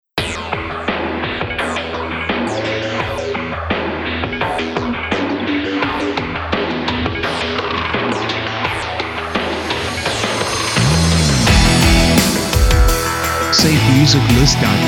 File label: Bang